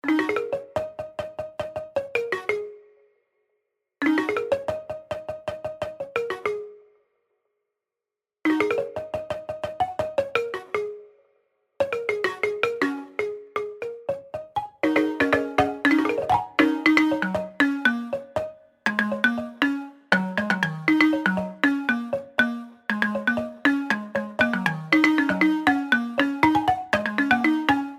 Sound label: Roots Balafon